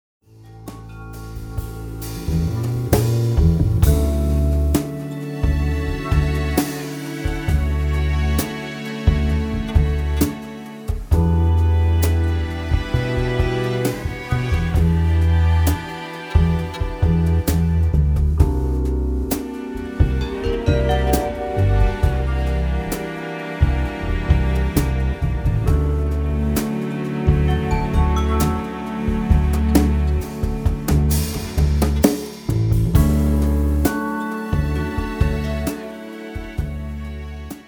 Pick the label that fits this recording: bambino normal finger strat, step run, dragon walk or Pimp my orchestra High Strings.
Pimp my orchestra High Strings